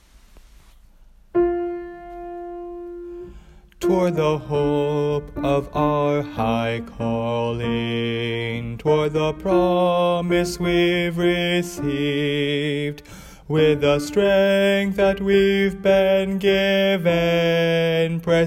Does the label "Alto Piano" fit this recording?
Yes